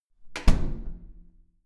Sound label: door close